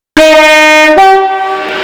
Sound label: 2 tone